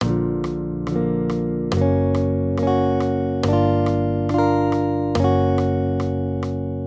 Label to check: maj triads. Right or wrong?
Right